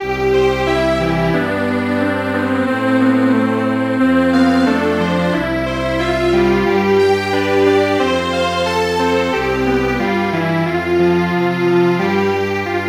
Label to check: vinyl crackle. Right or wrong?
Wrong